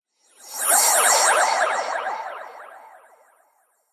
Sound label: Space Swirl Whoosh; Whoosh Sound Effects